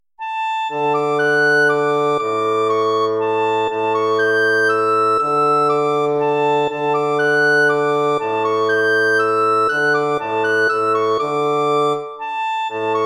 waltz; Carulli Ferdinando

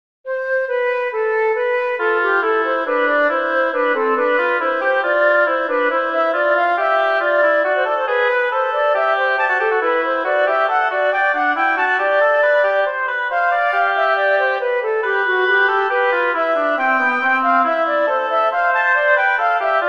canonic variation for flute oboe; bach johann sebastian; vom himmel hoch komm ich her

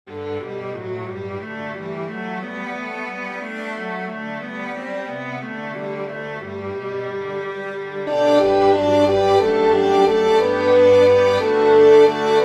aria for horn strings; bach johann sebastian; nehme zehntausend dukaten

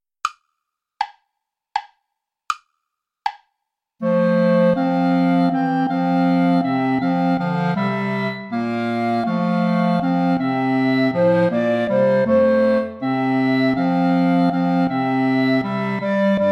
play along without clarinet; herr jesu christ dich uns wend

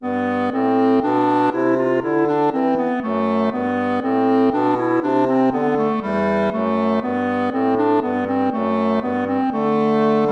baroque minuet major

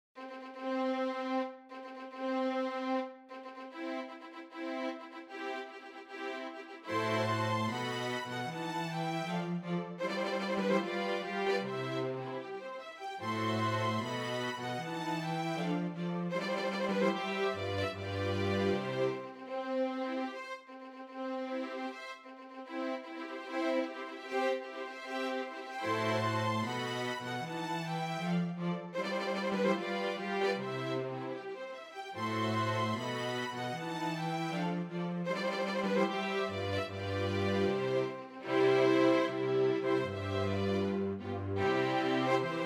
wedding march; mendelssohn bartholdy felix; midsummer night dream; abridged

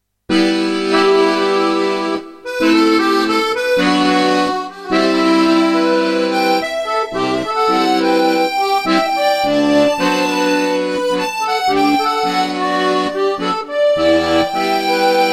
duet

